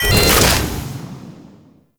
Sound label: Railjack Weapon Ready; Warframe; RailJack Weapons; Modular Weapons